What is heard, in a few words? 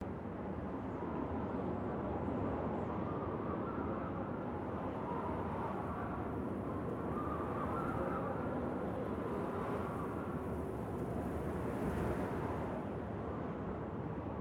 Environment Small Wind; God Eater Resurrection